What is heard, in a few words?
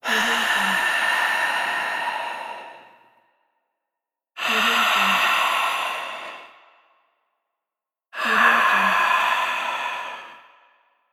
exhale breath